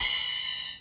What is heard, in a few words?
wet open high hat g key